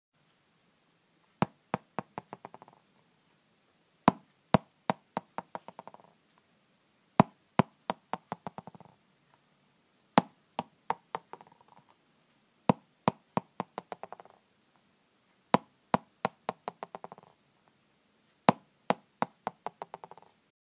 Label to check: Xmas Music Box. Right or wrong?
Wrong